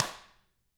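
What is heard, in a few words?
tama stick; ILIO; Double Platinum Drums 1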